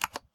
keyboard delete; Golden Crayon Sounds

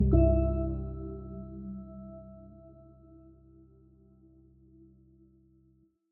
Voice Trigger Training FX; iOS Sounds

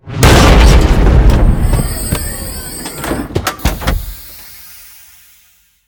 aircraft spawn; Original TK Sounds

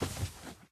cloth step; Golden Crayon Sounds

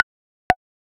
ChromeVox Loading

